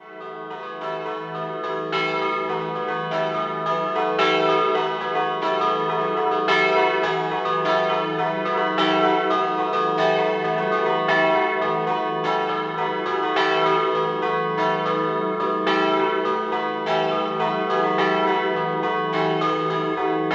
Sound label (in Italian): Latisanotta Scampanottata, campane